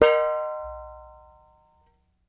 Clay Pot, World Percussion One Shots; Sony CS

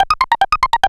Korg, FX

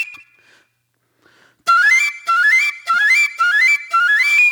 Eastern Flute

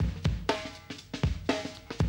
120, Classic Drum Loops; Best Service; XXL 1500 Classic DrumLoops; Sample CDs

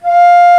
alto flute mf f3; Digital Sound Factory Virtuoso

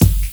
KIT, Dance Mega Drums, HIP; Best Service

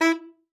sax e4, Saxophone stacc forte

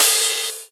Open Hihats, Cymbals

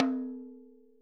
ROTOTOMS; Sonic Reality - Vintage Timetraveler

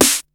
SN, SNARES; USB Soundscan vol.20; Fresh Disco House I; AKAI

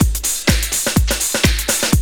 loop, Roland; Sound & Vision - Gigapack I

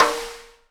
snare RIM RING, Live Snares

